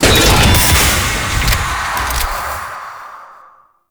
Elevator Lift Stop; Warframe; Tau; World Seeder Descent

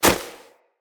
Walk Snow, Footsteps; Operator

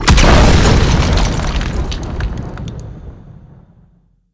Mech Summon Impact; Vehicles; Nechro Mech; Abilities

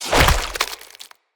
Corrupted Slug Foley Death; Creatures; Movement

